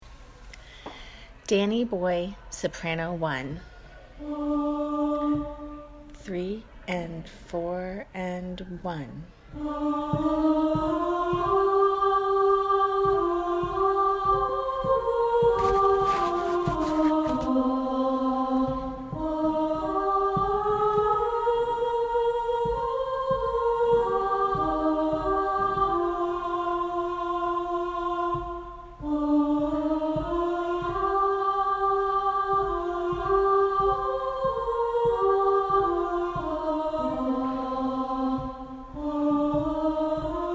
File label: soprano; danny boy